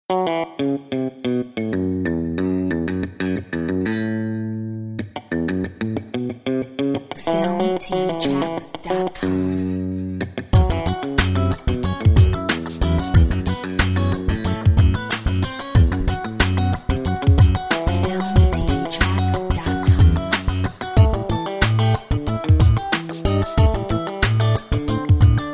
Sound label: Double funk